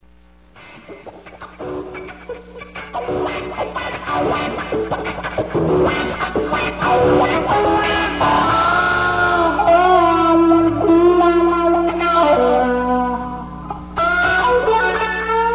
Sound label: cry baby